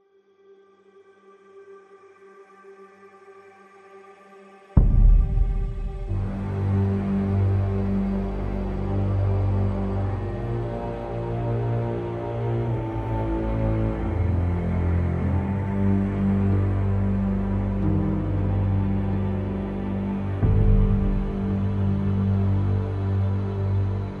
Loop Serious

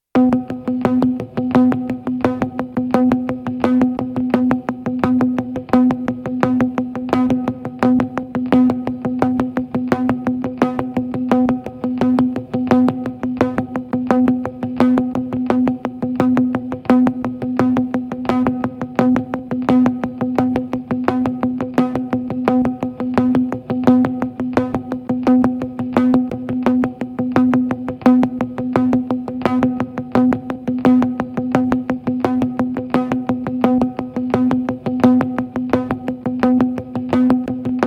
86bpm Layer Loop

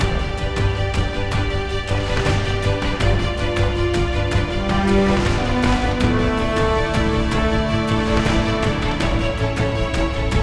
Mood High

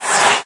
Whisper Death; Peace, Death

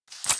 Currency Cash Flyoff; Taps to Riches; Android

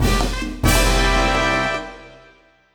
Small Fanfare; Kirby and the Forgotten Land

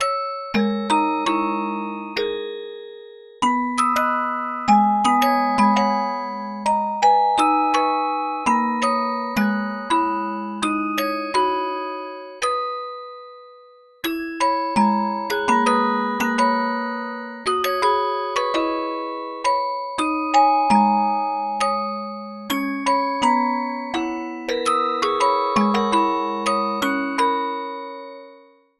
Music Box; the great ace attorney chronicles; Sholmes' Disc Playing Attempt #1

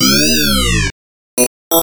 Unknown Jingle

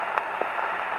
radio

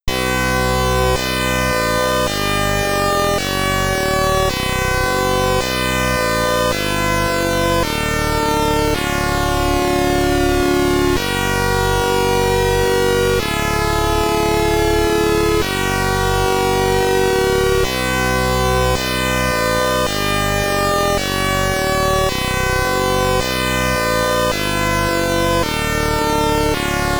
Boss SID Stereo; Gemini Wing; Commodore 64

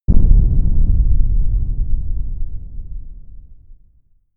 Large Collision; Universe Sandbox 2

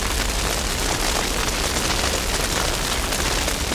Amb Fire; Boomerang Fu

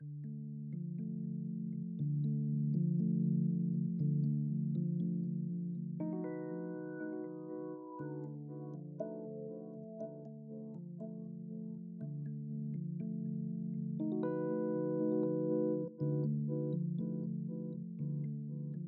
Synths & Keys

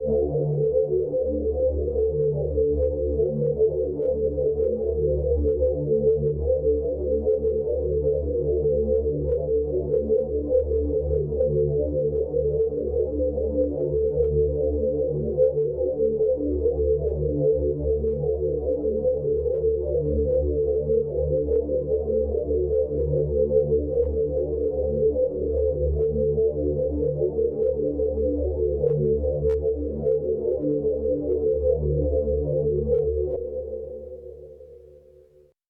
Hightech Drone; Far Cry

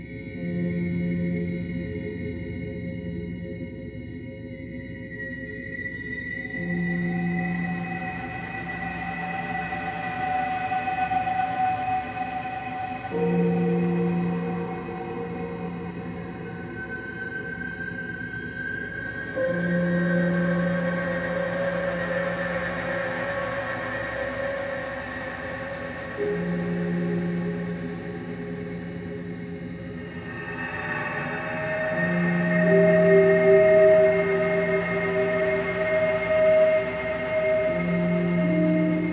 Menu Music; soma gamerip 2015; Completion Menu Music

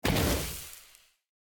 Burnt; Boomerang Fu

